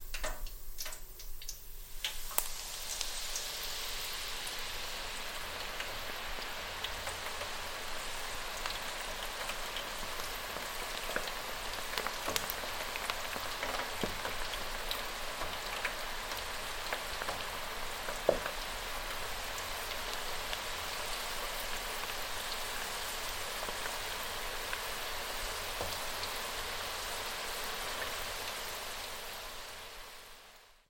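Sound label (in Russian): жарка чипсов в домашней фритюрнице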